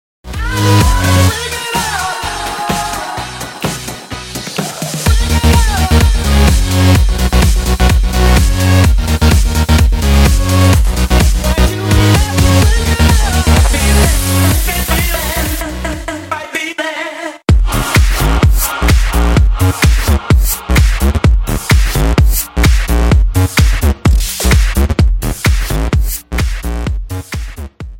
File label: mixed breaks, reggae